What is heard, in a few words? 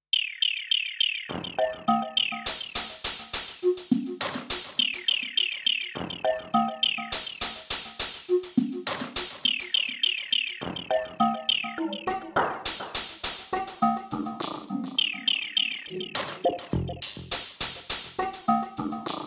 LFO to Sampleslot